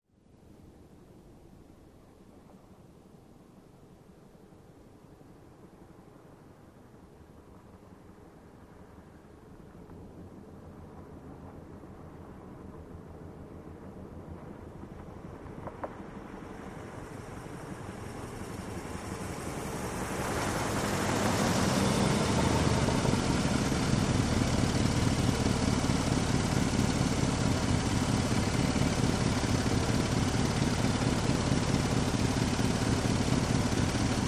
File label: Car Slow Idle Then Off